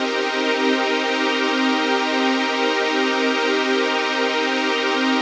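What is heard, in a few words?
trance pad; Best Service; XX Large - Pads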